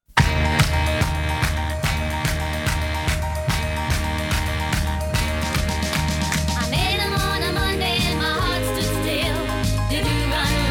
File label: strophic